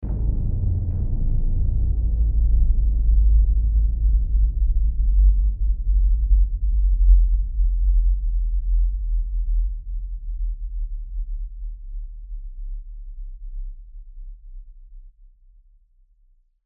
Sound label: Bass Reverb, Deep Tone; Sound Design